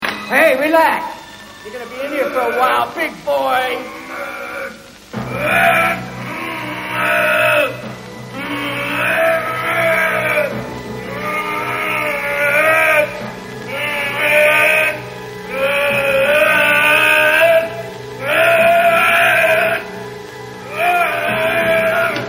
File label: grunts